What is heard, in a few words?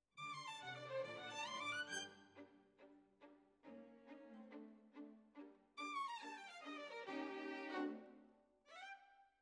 Violins, jazz for piano